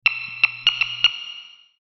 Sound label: Logo Multiple Squeaks Delay; Sonic Logo; Blastwave